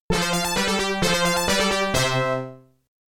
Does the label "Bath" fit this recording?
No